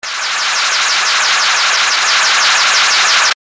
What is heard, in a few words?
ufo Taking Off